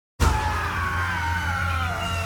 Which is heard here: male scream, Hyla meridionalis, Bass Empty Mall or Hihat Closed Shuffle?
male scream